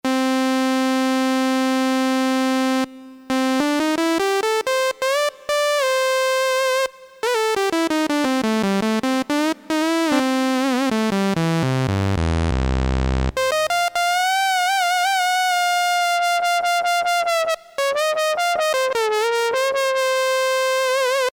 Saw, Korg DSS-1, Created Waveforms

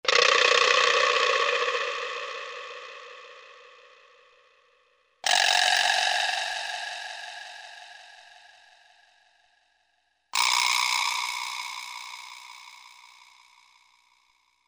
Vibraslap, Korg MSC-8S Percussion

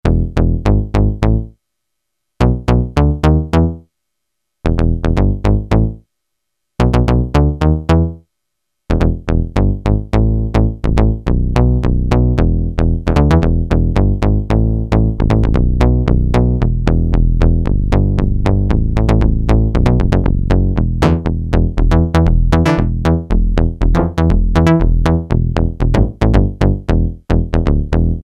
PICK BASS, Kawai SX-240

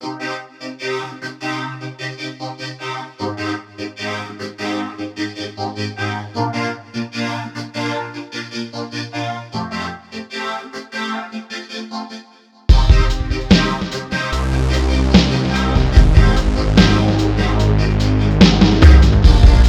Formant Beat

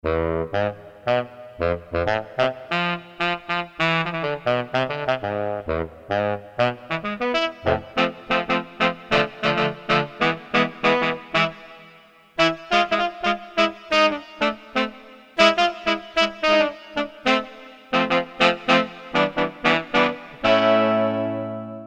GROUPSAX, Korg DSS-1

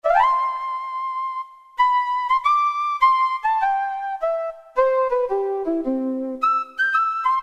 Breathy Flute; Roland PN-D50-03

